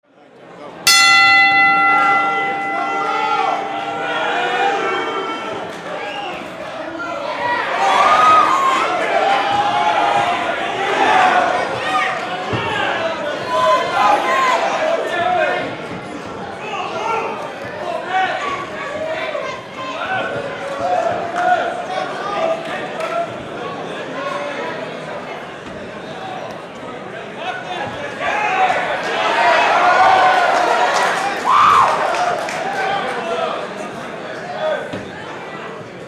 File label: boxing fight atmo